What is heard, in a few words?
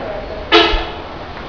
fuso horn